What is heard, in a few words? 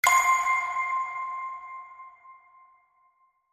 Distant Ping